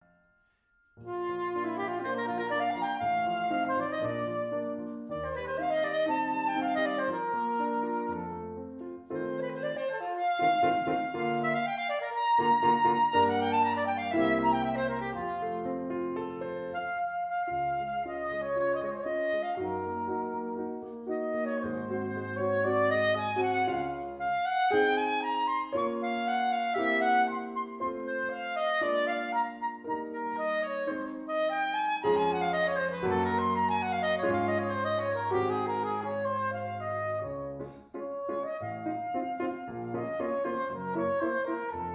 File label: Saxophone; saxophone alto